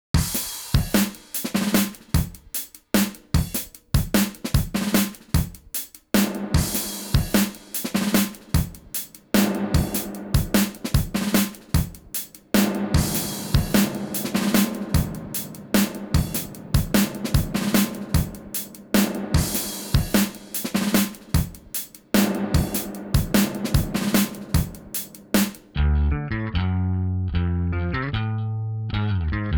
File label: REVERB rock